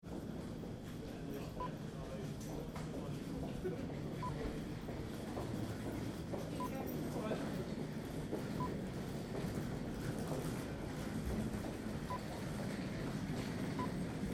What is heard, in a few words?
Train Station Passangers On Platform